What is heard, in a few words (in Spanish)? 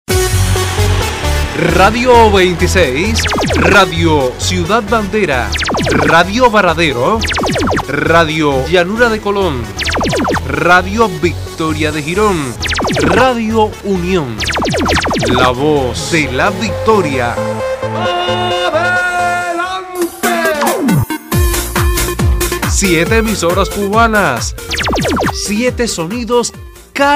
jingle emisoras